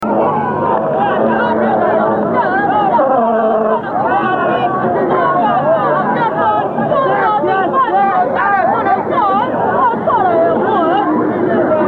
shrine dictorphone mexico, street recording

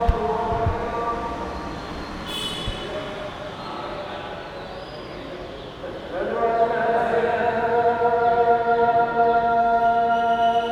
Mosque chants, South India street recordings

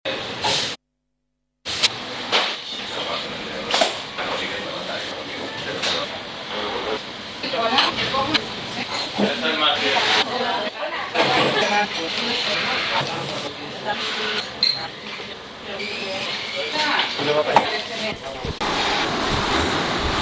barc cafe speed up, Spanish street recordings

